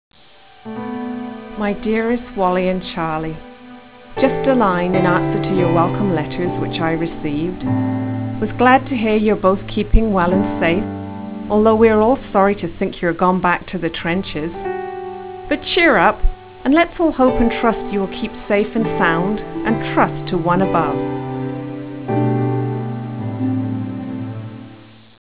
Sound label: music